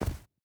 footstep rubber boots snow jump land; Rust

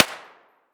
pb silenced indoor distant; Escape from Tarkov

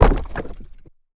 wall external stone deploy; Rust

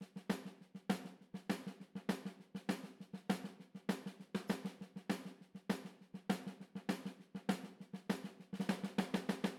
music interactive; World of Tanks

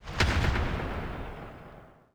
carbine 1shot echo

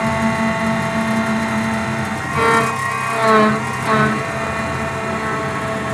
turret; World of Tanks

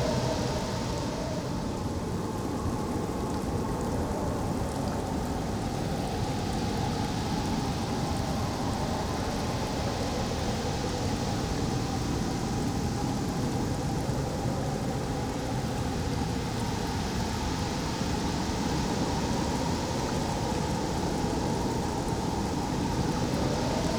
ambience arctic beach day breeze drizzle; Rust

